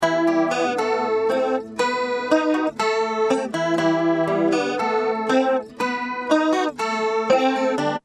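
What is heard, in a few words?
acoustic guitar cs505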